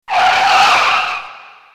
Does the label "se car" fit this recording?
Yes